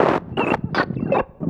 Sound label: SFX LP, 160 BPM, Drum & Bass; Ueberschall; Drum & Bass Flash Trackz